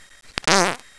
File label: fart